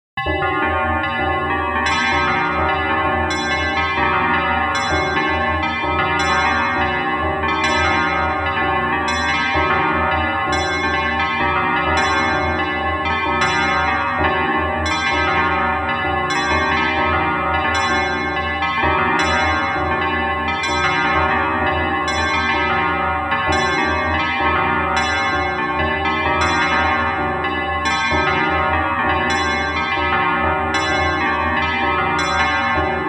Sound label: All Clear; arcade disc in jaleco variety